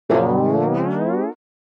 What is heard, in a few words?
Cartoon Effect Guita